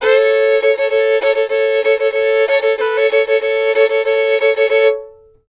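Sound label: fiddle loop 80; Sampleheads; Whole Lotta Country 1 & 2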